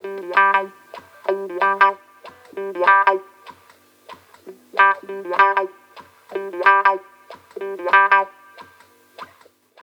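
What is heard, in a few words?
guitar, Roots Funk; USB Soundscan vol.41; AKAI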